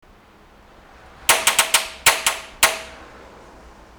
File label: flat batt